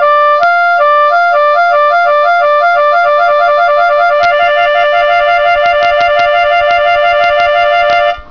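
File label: trill, harmonica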